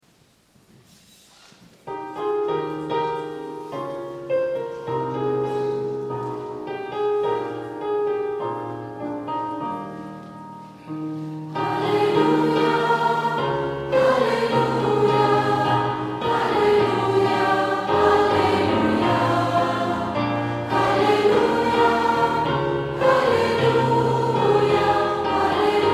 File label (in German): Halleluja Weichnachten Chor